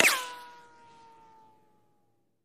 Bullet Ricochet Sin

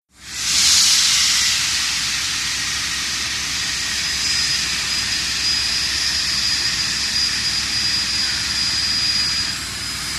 Train Hiss Brakes, train station

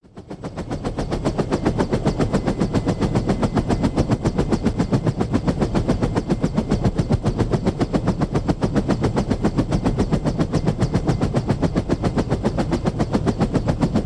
Heli Blade Whops Med, AH-64 Apache Blades

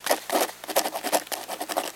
Scribbling A Pen, writing

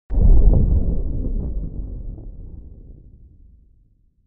Bass Impact Explosi; impacts

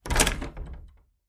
Attic Closet Door Clo

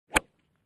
Golf Ball Hit W Wood

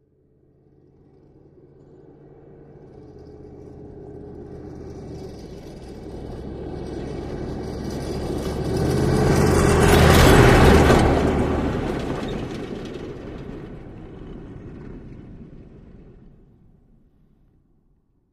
Humvee Fast By Rattle

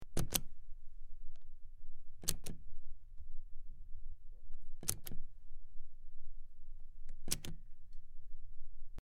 Button Press In Out, switch clicks

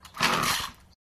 Chainsaw No Strt Rev

